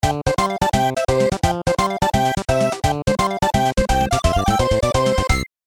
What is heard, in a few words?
Grand Victory Dance; Kirby Rip Attack